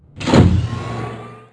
gate up; twitch-1.0

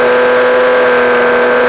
engine, cars; ultimatestunts

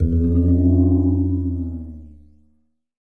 spawngate; twitch-1.0

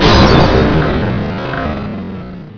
crash fatal, cars; ultimatestunts

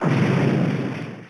hunter death; twitch-1.0

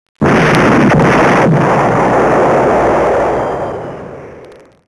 bomb blast; twitch-1.0

